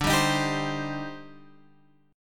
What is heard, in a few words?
C Minor D; Cm/D strummed; JGuitar